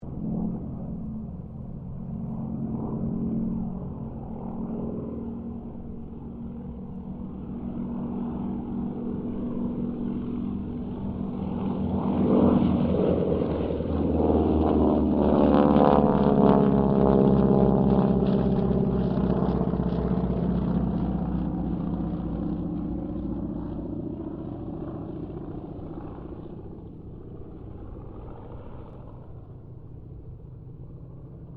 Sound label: AIRCRAFT CT4 WINJEEL WIRRAWAY HARVARD PASS; Mixed aircraft squadrons; Airplanes